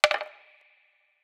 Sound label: perc; eddyflux